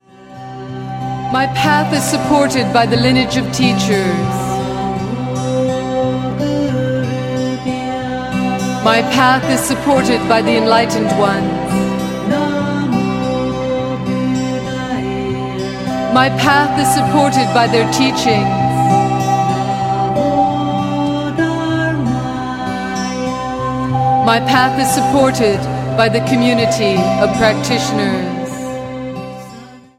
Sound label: Refuge and Bodhicitta Sanskrit; Refuge, Bodhicitta, Buddhism, Tara, Prema Dasara